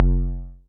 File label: Multi-Low-Perc